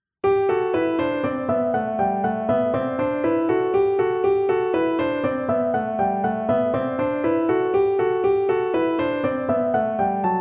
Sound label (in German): Tonleitern Spielen G Dur; Paradiddle Kapitel 1